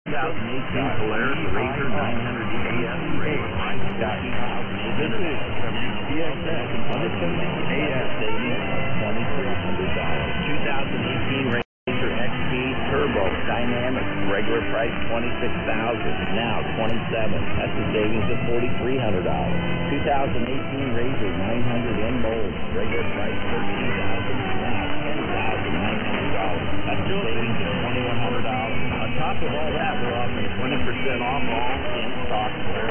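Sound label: regional Mexican, radio; 1170 WCXN Claremont NC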